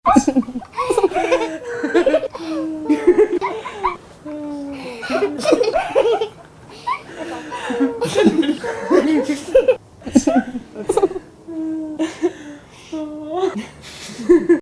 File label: laugh loop